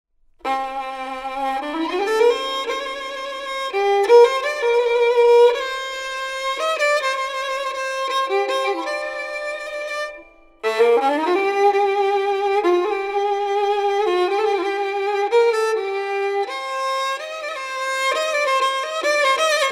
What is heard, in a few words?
Gadulka; BABYLON’S FALL